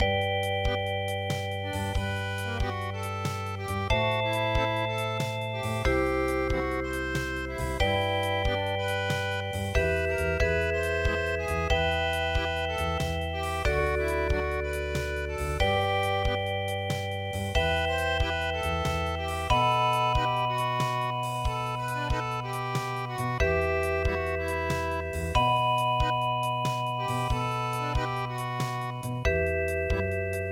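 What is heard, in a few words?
musette; Addio A Napole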